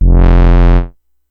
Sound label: Synth one shot F, Bass; Synth Bass One Shots; Slate Digital BASSHOLE Sample Pack